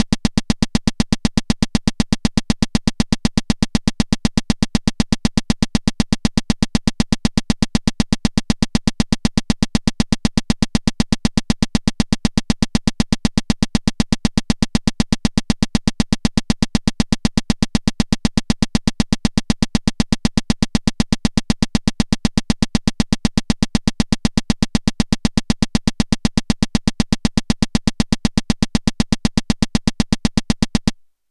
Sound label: Rimshot; Deformer